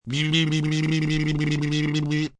Mouth FX Babbles, Mouth effects, musical lips (human voice); Sound Effects:Human; VoiceBox